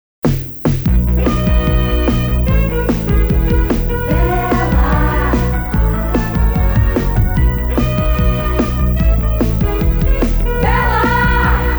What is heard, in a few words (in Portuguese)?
Trilha; Tela; IpanemaFM; Agencia Jinga